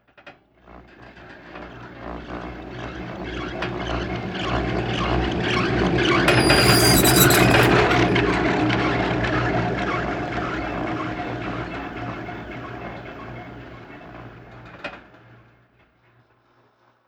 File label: Cart Tower